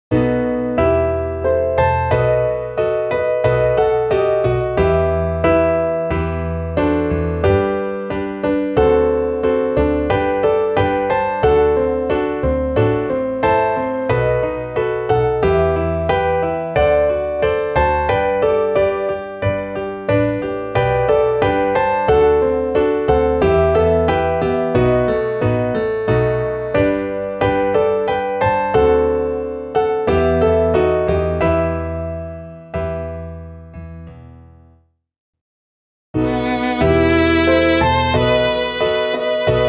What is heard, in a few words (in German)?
midi klavier; Reitertag; Die Faust am Bug, den Fuß am Bügel